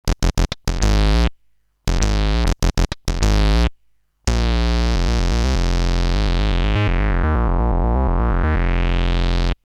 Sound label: bass; HardSID